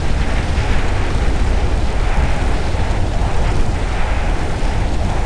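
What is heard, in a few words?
ambience lava fall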